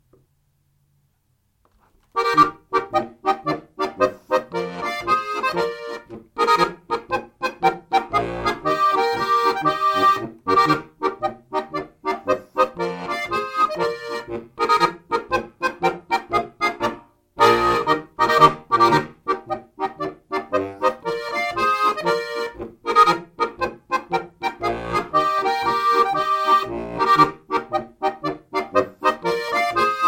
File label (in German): Gasteiner Polka; Salzburger Volksmusikverlag